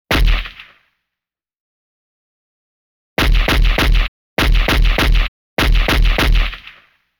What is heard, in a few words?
AK-47